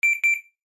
Appliance button press tone; Home and Household

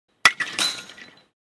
Baseball ball hits a fence

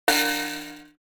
Cartoon spring metallic; boing

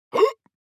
Man having big hiccup

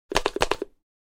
Sauce pump dispenser press

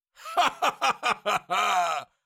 Cartoon lion voice laugh laughter

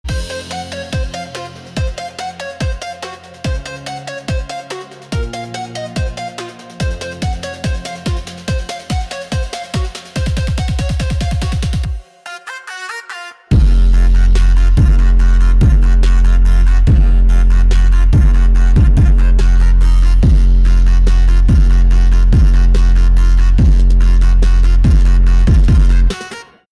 Heavy bass rumble bassline; bass boosted

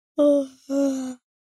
Child sleepily yawning

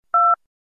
Telephone dial tone

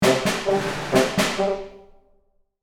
Marching brass and field drum riff

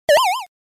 Arcade 8 bit death

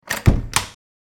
Large cottage door close

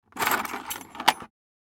Picking up from a jewelry casket

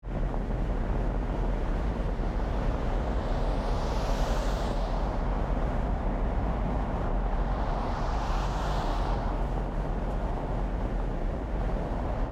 Spaceship ambience loopable exterior

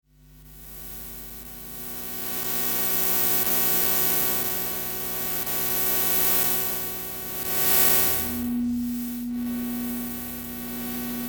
Multiple lamps and cables flowing energy textures

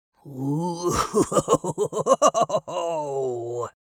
Witch fantasy vocalization laughing cackle evil

